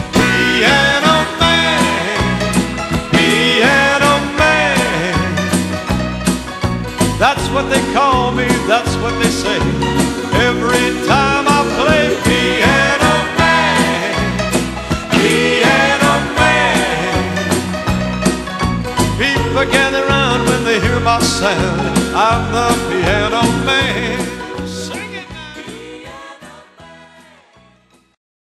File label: Piano